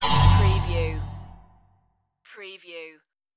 hollow space shot

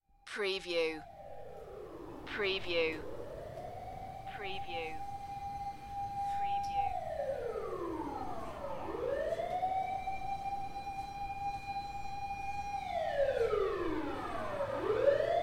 siren distant to close Tokyo Japan

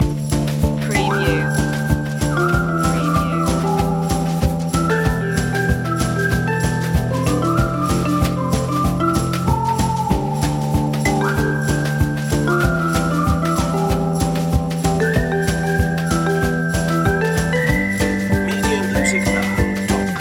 conifers loop

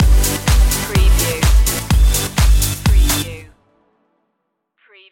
rhythm changer stinger

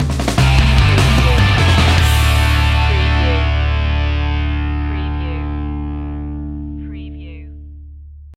w8ter boi sting

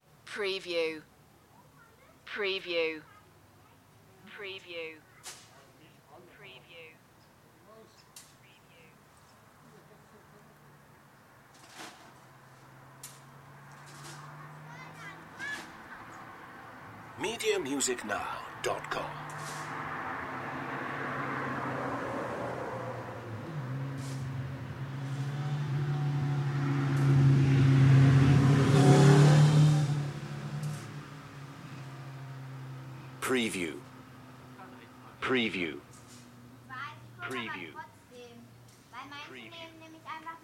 rural garden ambience

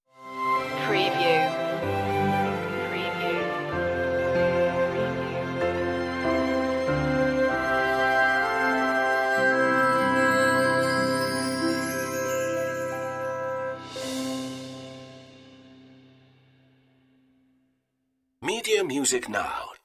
orchestral stinger; silent night; media music now

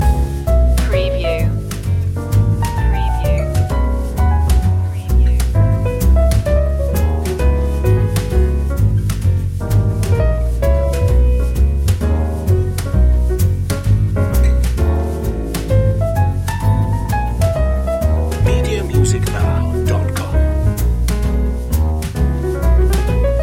swinging life loop

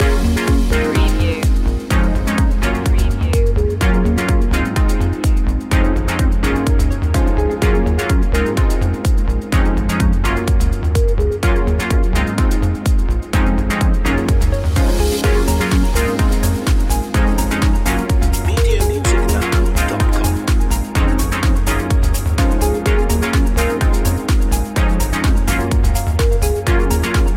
project positive loop

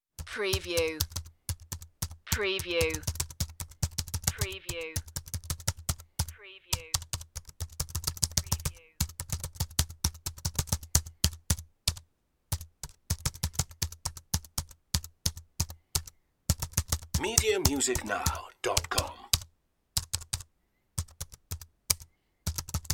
keyboard typing

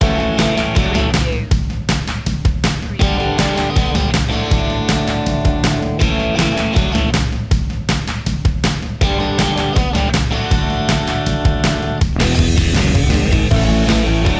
moving forward

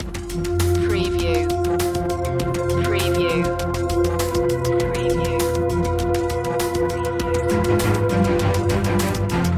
alien landscape loop

